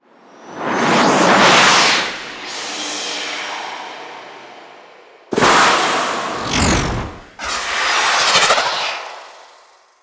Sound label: Archwing Space Extraction Start; Archwing Spack Docking